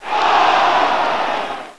Foule; Carotto 1.0.4

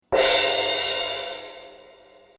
SUCCES; MOBICLIC 72; CPLUSCLAIR

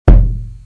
rate

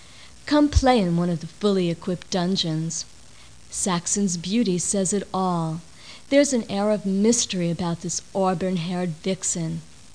saxo

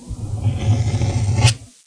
crayon

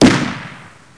GAR fusil; MOBICLIC; GARE

